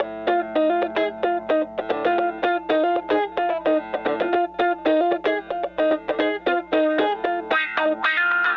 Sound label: Raw Funk Guitar; Sonic Foundry (Sony Creative Software); What it Is! 70s Analog Funk